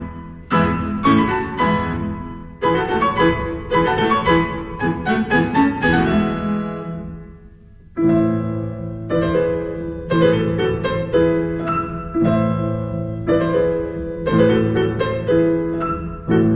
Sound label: piano duet; Aurora Duo; Swan Lake- Danse hongroise (Hungarian Dance)